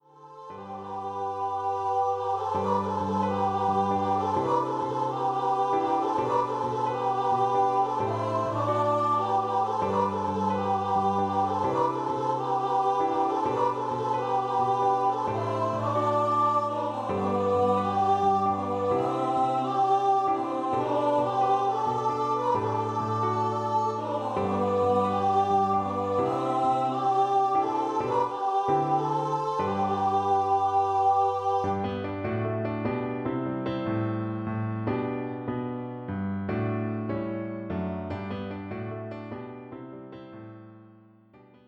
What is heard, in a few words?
3 part song for treble voices